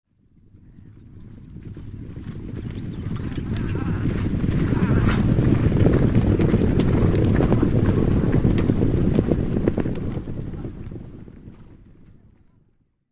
100 Horses Charge By 100 Horses, War Horses Armies & Weapons; Historical; Hollywood Edge Historical Series